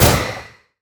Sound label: item glacial spike; LoL; items